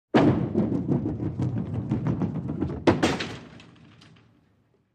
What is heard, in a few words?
Throw Bowling Ball Ro